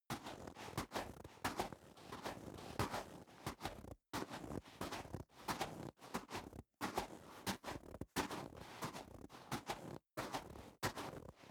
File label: step snow walk sequence